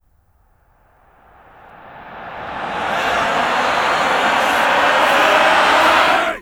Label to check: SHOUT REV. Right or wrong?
Right